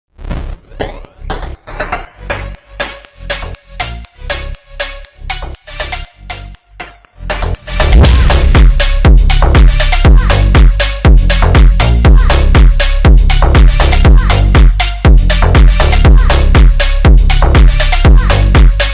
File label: Phonk Krushfunk